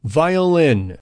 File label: violin